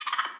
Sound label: facehugger, alien